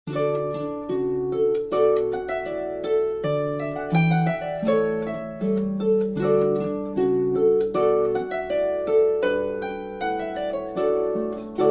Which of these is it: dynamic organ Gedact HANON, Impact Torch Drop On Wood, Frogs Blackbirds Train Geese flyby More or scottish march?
scottish march